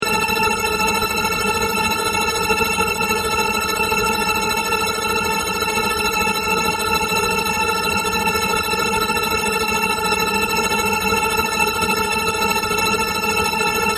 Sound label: Ambient Fluctuating, Drone; Sound effects created by manipulating other sounds; Drones (Sound Effects:Sound Design)